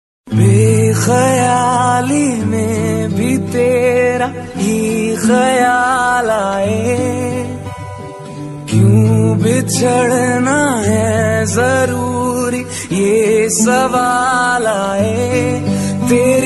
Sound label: hindi song ringtone; kabir singh